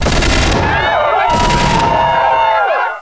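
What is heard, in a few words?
sword fight; CIVILWAR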